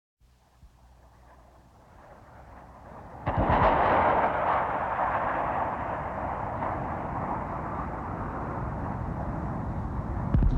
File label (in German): Luftwaffe Falcon Missile zerstoert eine Fernlenkrakete